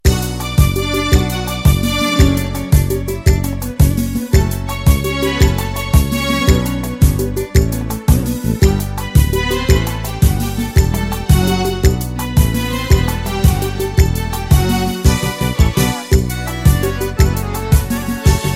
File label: Accordion